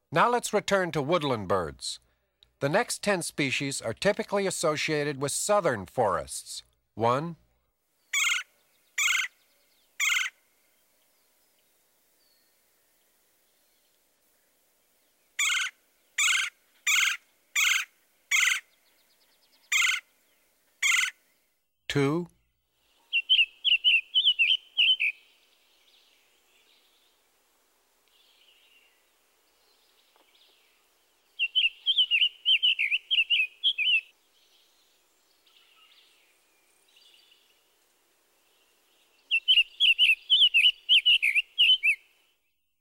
Southern Forests, Birds, Birding By Ear

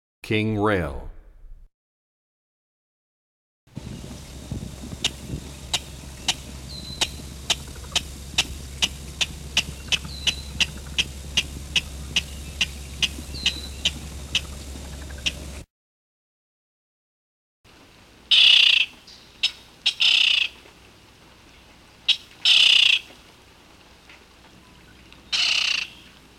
King Rail, Birds; 101 Bird Songs

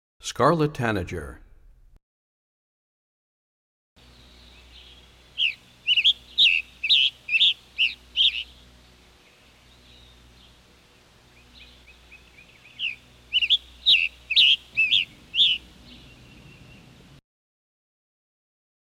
Scarlet Tanager, Birds; 101 Bird Songs

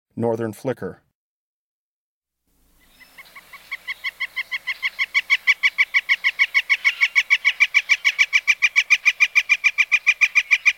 Northern Flicker, Birds of the Chicago Region

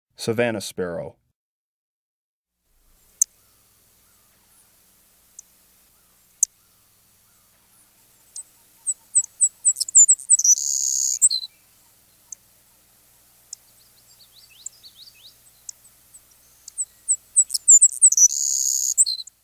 Savannah Sparrow

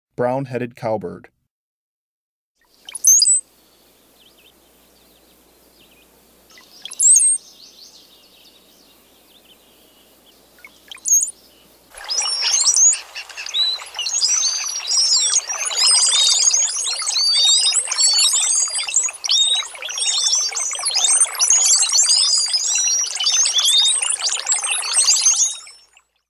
Brown-headed Cowbird

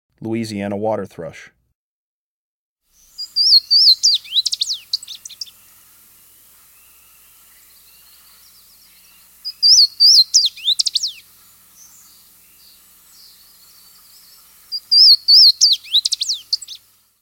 Louisiana Waterthrush

